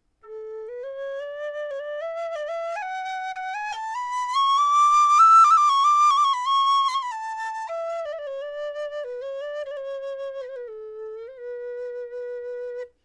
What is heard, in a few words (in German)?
schilfblock a